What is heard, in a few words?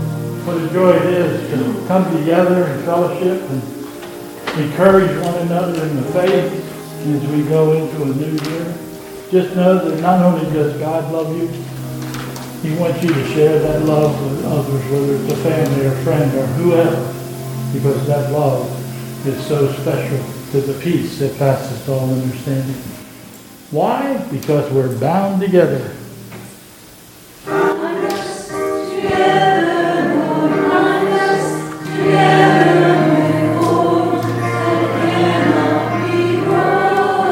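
Closing Remarks and Chorus, church service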